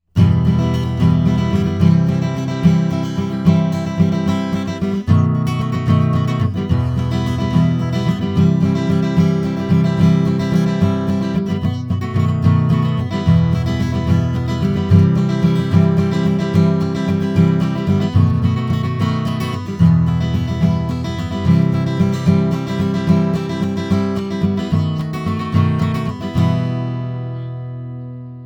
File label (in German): Akustikgitarre Pre 73 SE R1 Flat